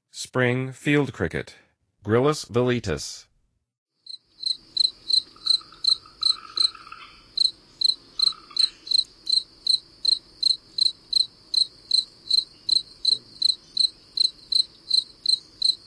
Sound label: Spring Field Cricket, Songs of Insects; N. America